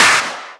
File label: Claps; Vengeance; Essential Clubsounds Vol.4